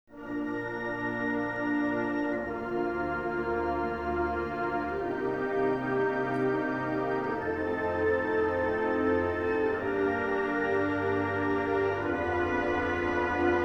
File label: Hype Chorale, band